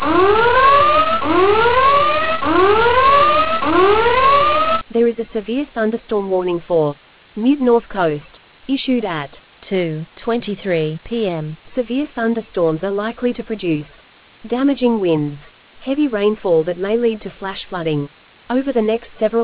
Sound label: storm audio